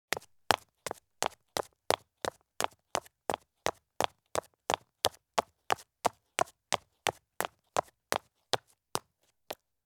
Women's Thin High Heel Shoes Take Fast Steps on Concrete Stairs; Sound Ideas; Footstep Sound Effects